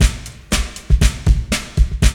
LOOP; X-Static Goldmine 2; IMIX LOOP

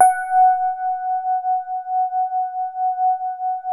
DX7 mid F#5, e-piano; Music Store

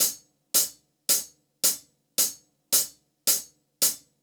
Hi-Hat Groove

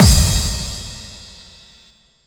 Main Room Stacked Hit; essential drumkit

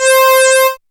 Dance Synths, Acid Bubble, c

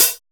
LINN CHH, MACH.HATS

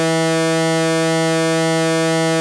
2600 E3, ARP 2600; Hollywood Edge - Giorgio Moroder Rare Synthesizer Collection

